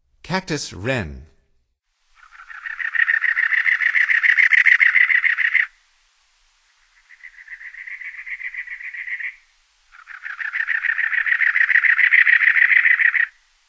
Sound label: Cactus Wren, Songs of Birds of North America; Stokes